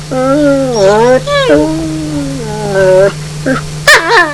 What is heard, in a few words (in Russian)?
Vorchanie